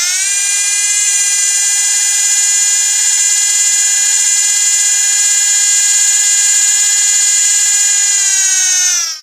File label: teleporter build open; tf